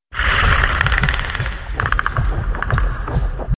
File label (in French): grognement; Predator Mod